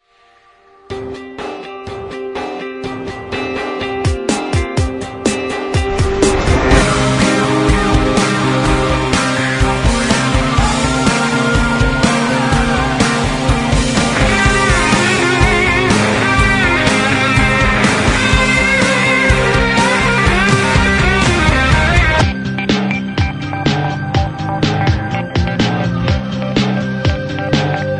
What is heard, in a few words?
wake up screaming